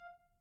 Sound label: Grand Piano Main Release F4, Kawai; Legacy